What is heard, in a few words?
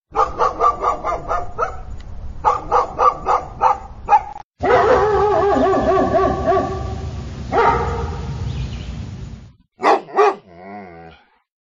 Dogs Talking